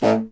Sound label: C. Bassoon, solo instruments